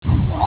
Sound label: shield; robobombo